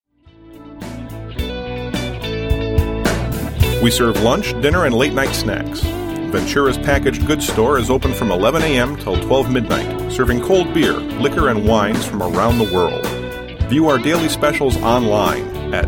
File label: onhold; venturas